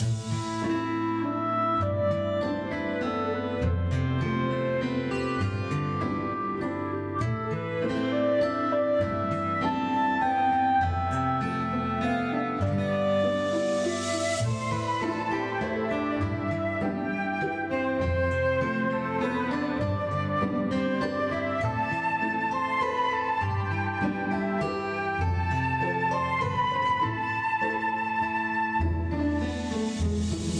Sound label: Bridge Lobby